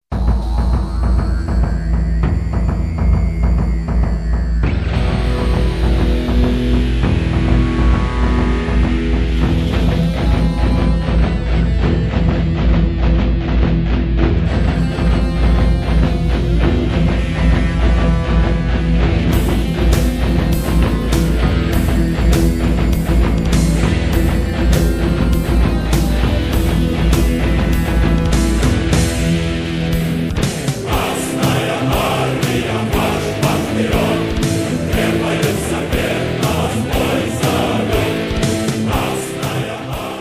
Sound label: guit elec; avec partie samplée des choeurs de l'armée rouge